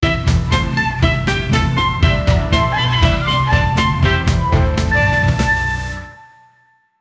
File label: intro police crime, police; intro police crime live